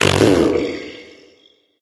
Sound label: tongue light; smite; voicepack; hel